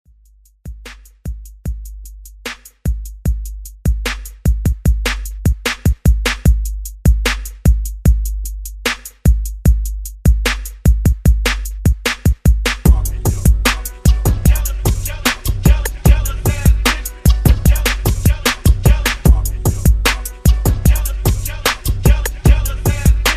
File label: Hiphop Redrum